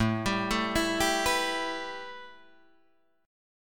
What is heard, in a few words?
A 7th Suspended 2nd Guitar Drop D